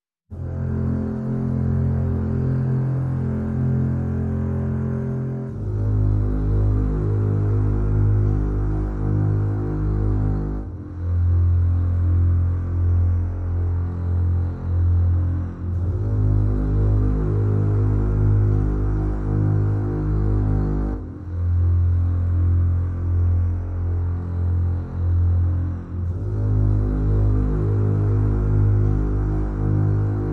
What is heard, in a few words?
Bass Mix